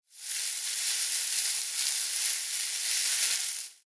Foley Grass Movement Foliage; Environmental; Nature

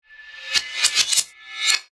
Suction Reverse, Suction, Reverse, Debris; Production Element, Imaging Element, Accent, Transition; Warped; BlastWave

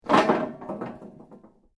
metal rods move

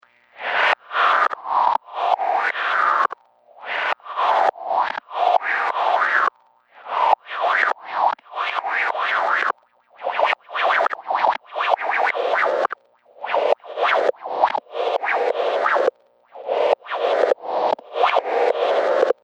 Gust And Glitch Magic Loop; Looping; Magic Sound Effects

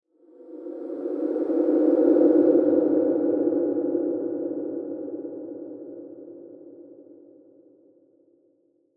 Ghost Howl; Horror; Ghosts; Blastwave

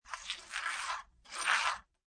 CPU Voice

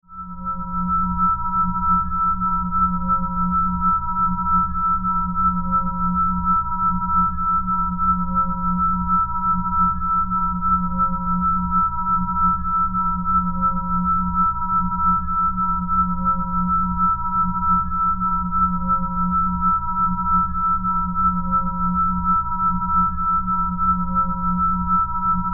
Sci Fi Crystal Drone Fluttering; Alien; Blastwave

